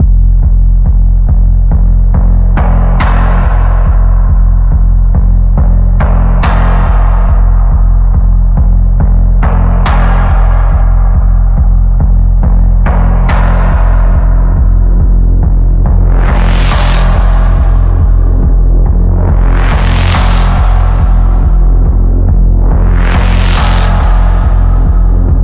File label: Deep Pulse, F